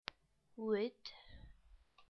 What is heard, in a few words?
wood